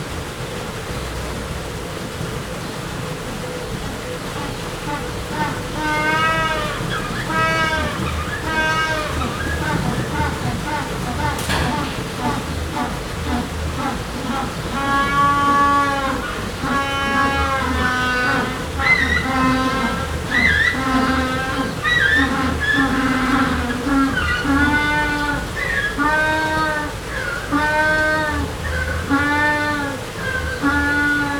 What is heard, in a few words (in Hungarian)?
felnőtt állatok, vízesés, pápaszemes pingvin; jaszberenyi zoo 2015